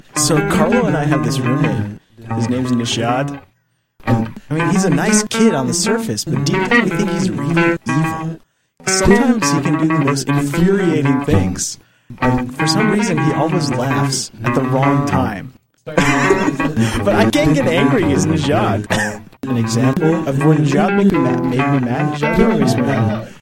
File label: Gtr